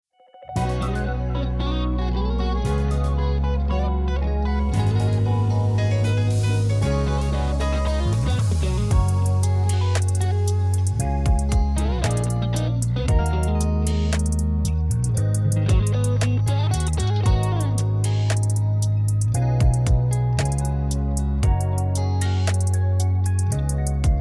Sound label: Color Noise inst